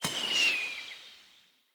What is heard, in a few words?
Bow Arrow; Weapons; Player; Bows; DendritBow